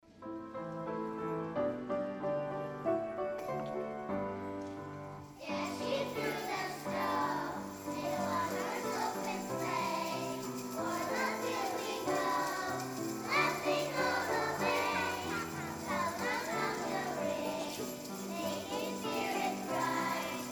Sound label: jingle bells